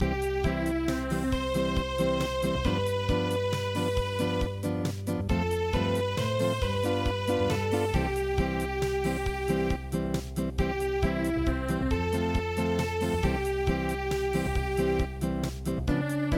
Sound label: Acoustic